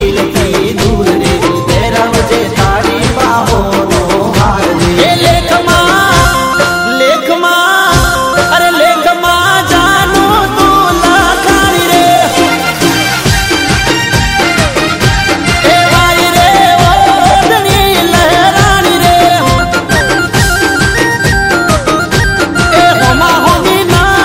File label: gujarati song ringtone